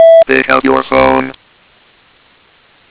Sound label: call; Alcatel; OT-C55x-PC-Suite; Content Library 3c